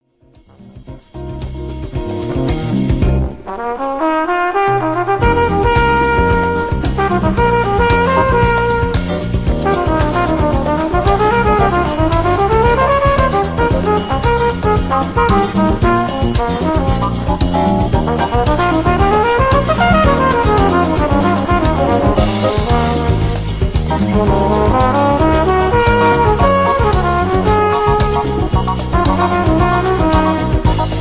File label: flugelhorn solo